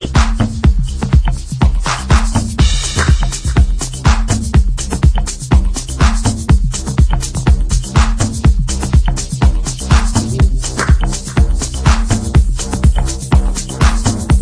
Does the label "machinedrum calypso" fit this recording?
Yes